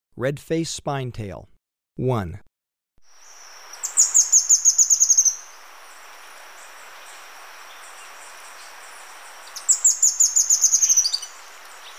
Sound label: Red-faced Spinetail; S. America